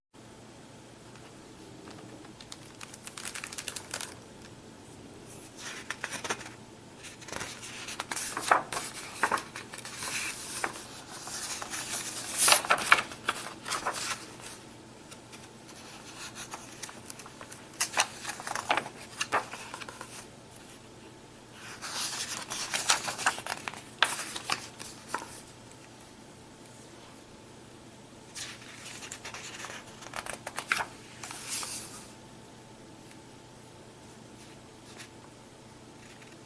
PRZEWRACANIE KARTEK; Książka; Grudniowe Opowieści

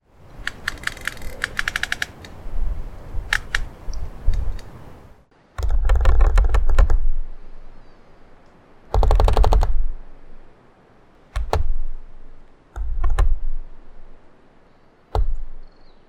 skrzypiące drzewo, Skrzypiące stare drzewo; Grudniowe Opowieści

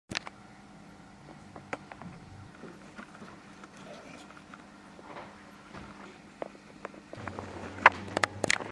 Odgłos Skorpion, Odgłos skorpiona

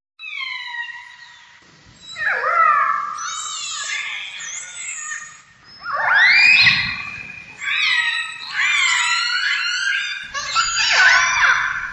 Odgłos Orki; Odgłos orki Oli

